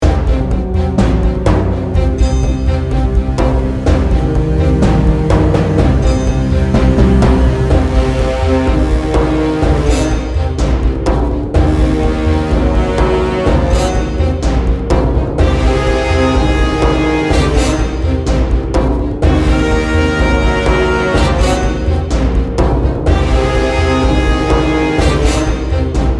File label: Orcs are Here (action - boss)